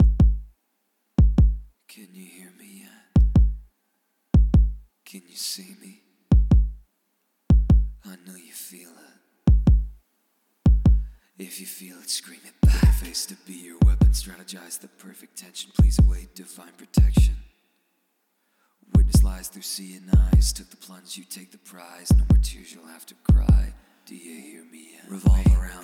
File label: VICTORY